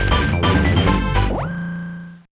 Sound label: Win; Orbulon